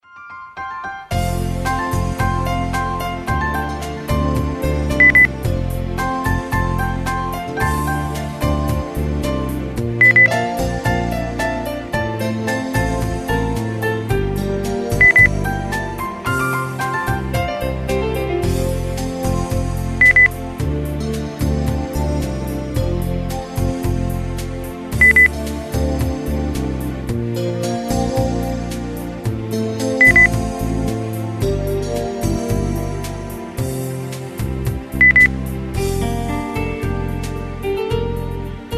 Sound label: pop countryside beep